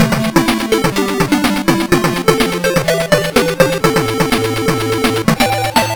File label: Game Complete; Lethal Weapon 3; Master System